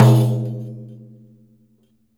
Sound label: DAIRES OPEN, Mediteranean Traditions percs